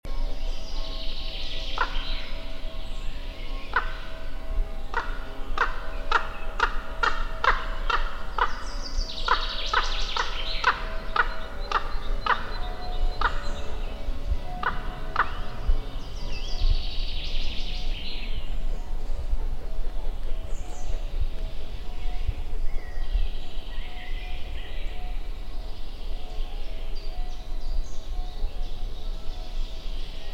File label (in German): kolkrabe